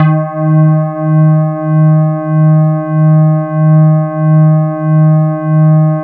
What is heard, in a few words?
E-HARP, DX HARP; Wizoo - Powered DX